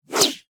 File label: swipe